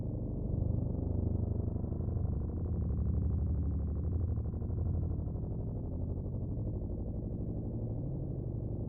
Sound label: Piano Drone; The Town; Intro Sequence